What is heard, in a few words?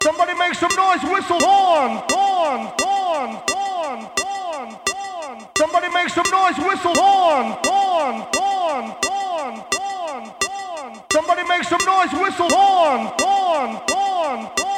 DJ Horn Mix